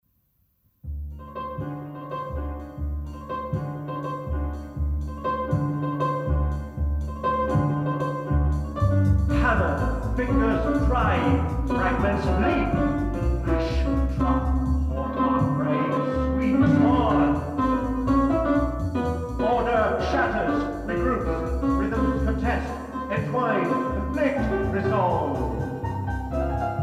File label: hammer fingers drive